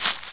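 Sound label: tumbleweed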